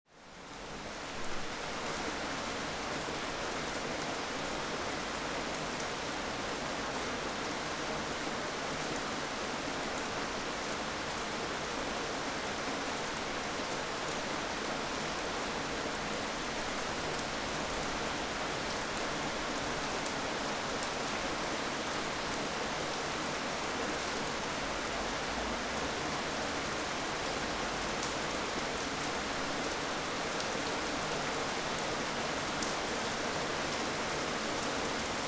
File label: heavy rain; Kraftig og støt regn, 30 minutter